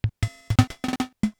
Yamaha SHS200; Sliced

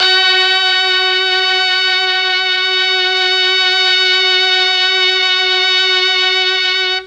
F#3 Brass, Mellotron MKII; Mike Pinder - Mellotron Archive CD

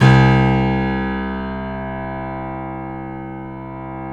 KEY, Steinway ff; Steinway M

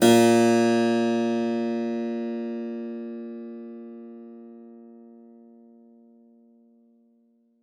SPIN A2, SPINET; Xsample VOL-7

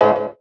chords

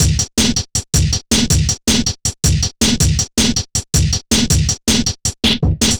Thug, Drum Loops, Double Time; Zero-G Smoke

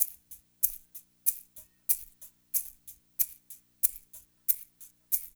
SHAKER, Loops; East West; Funky Ass Loops

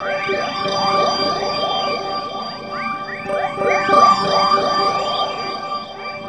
Guitar Textures

